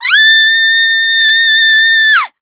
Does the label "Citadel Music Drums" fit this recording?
No